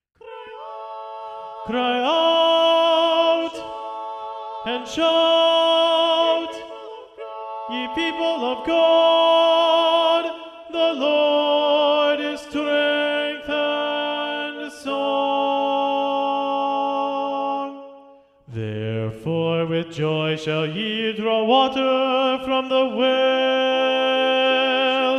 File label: cry out and shout, tenor 2 predominant; knut nystedt